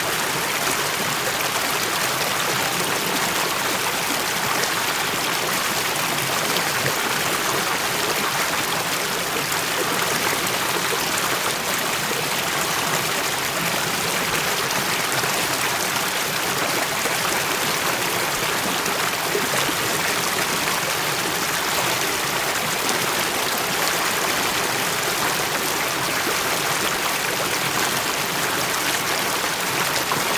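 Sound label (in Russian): журчащий водопад в безлюдных горах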